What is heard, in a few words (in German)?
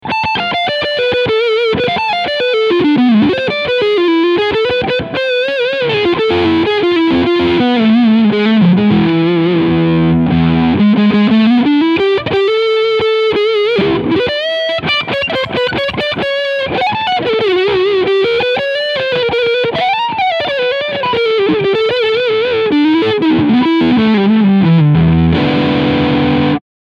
Hagstrom Super Swede Limited Overdrive beide Humbucker